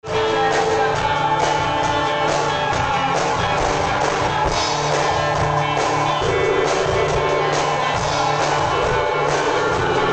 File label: sad and gone